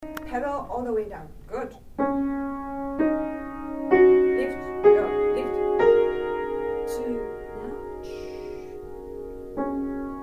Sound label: Flute; recorder